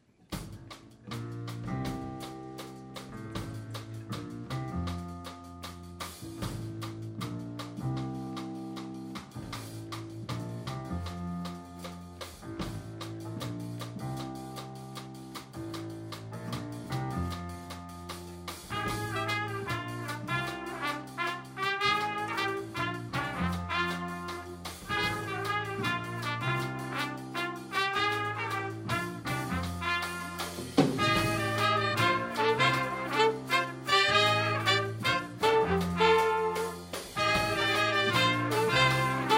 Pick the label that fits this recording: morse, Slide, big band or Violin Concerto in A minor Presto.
big band